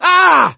hurt major, gambler, survivor voice

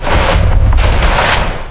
tentacle swing; source engine; hl1; video games

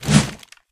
crowbar swing miss; weapons; source engine; left4dead2

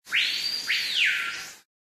animal call bird; Source Engine; tf; lair

